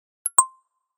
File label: message